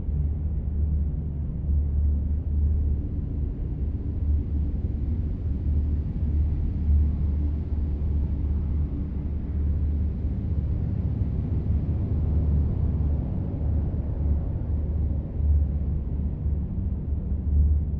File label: ship military defense station idle; Stellaris; aquatics; ships